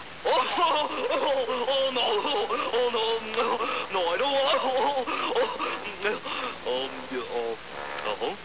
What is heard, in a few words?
buzzard